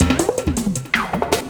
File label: Loop, Drum & Bass, 160 BPM